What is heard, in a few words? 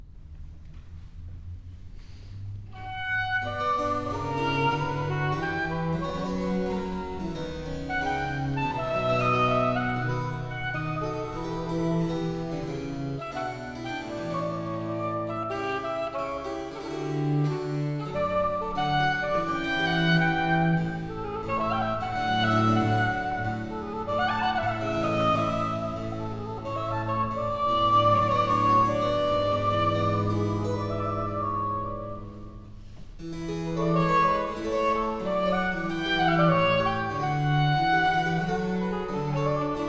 Sonata for Oboe and Basso Continuo in C minor Adagio assai; Giovanni Benedetto Platti